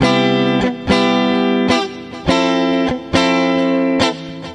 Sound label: guitar, Phonky Loops